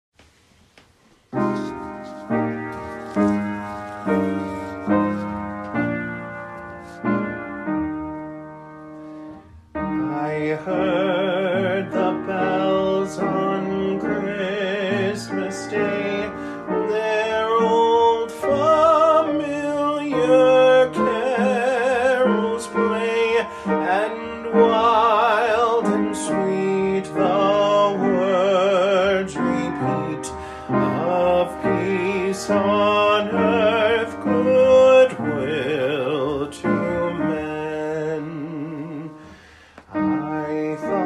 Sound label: I Heard The Bells On Christmas Day vocal